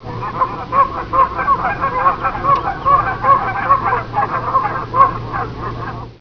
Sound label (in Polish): bernikla kanadyjska